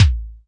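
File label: SC40 bass drum, Pearl SC-40 Kicks; DRUM MACHINES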